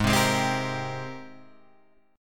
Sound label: G sharp Major 9th G sharp, Guitar Open G